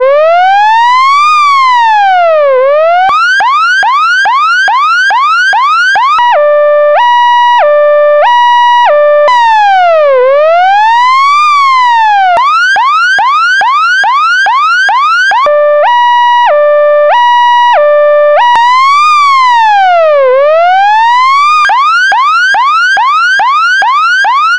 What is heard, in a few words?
emergency electronic siren